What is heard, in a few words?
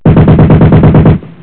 hvy mg; TacOps